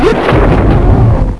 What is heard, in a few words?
blast